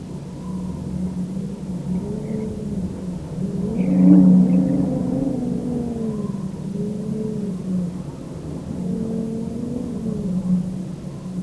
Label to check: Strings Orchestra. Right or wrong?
Wrong